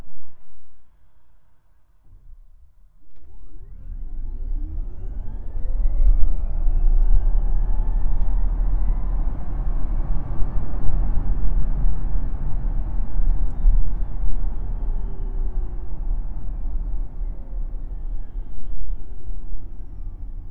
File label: tesla x90d normal mode couple max engine cabin compartment